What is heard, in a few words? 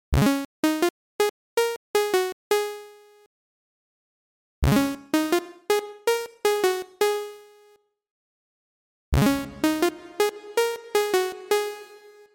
spring box